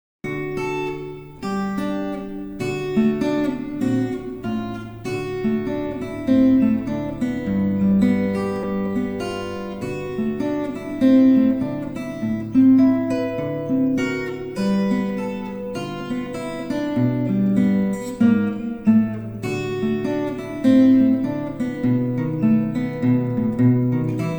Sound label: blues en re